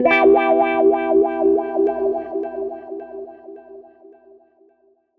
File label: SG Guitar Loop